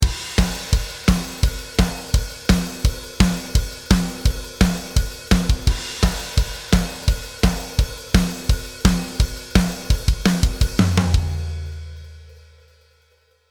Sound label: Hard Rock Groove 2 - Natural Dry, drums